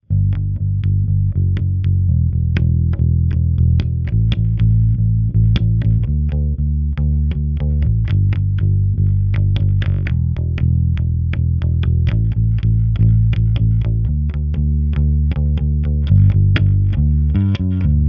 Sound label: dry Bass